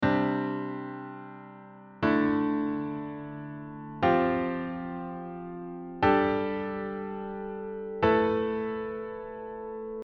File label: diatonic sus2 4